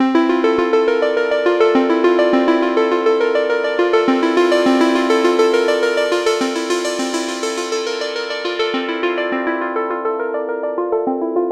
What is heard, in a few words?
Quaint Bells; Gforce Oddity 3